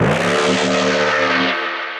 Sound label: Dark string, Pads; Ableton; Skitter and Step